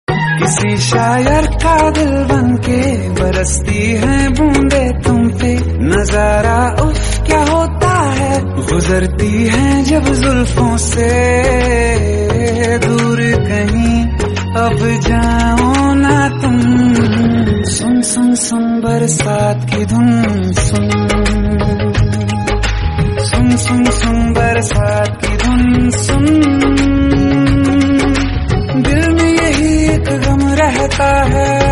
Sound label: Love Song Ringtone